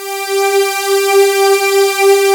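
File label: massive, JD8 pads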